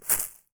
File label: Footstep Grass Light; Footsteps